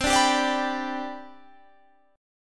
C Major 7th Suspended 2nd C down Guitar Standard, chord; CM7sus2; strummed; capo=2